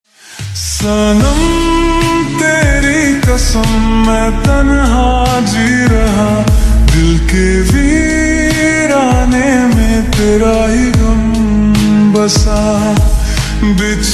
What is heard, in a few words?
Slowed Reverb Ringtone